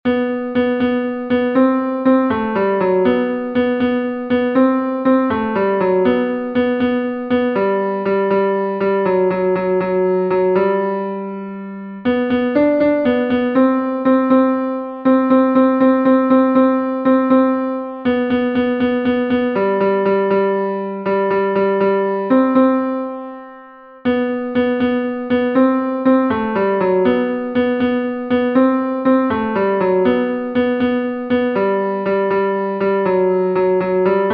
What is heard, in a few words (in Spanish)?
tenor, himnos en piano; cantos del camino